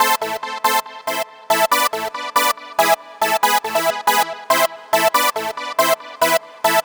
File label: Synth, Synths Lead; Dance